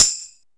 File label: SP1200, DRUMMACHINES